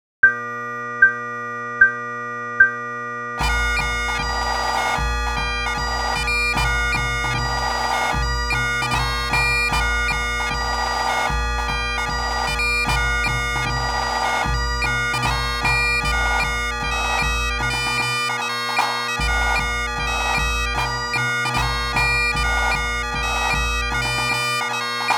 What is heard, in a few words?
High Road To Linton Full Score, snare drummers